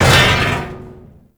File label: Mech Head Impact; Nechro Mech; Vehicles